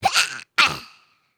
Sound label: Die; Lego; Strombie; creatures organic